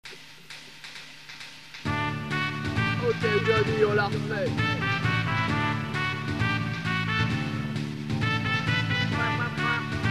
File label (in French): Trompette